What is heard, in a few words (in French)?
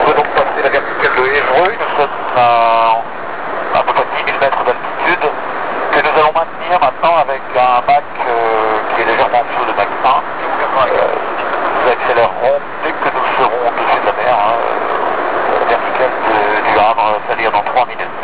Passage, aviation; Evreux